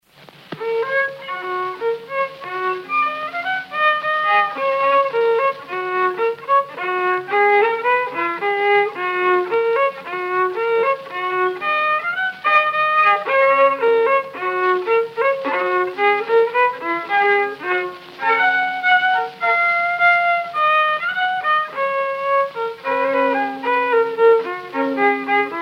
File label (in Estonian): viiul, folk; Kuusalu; Aaviku Raabiku; Gustav Lindstrom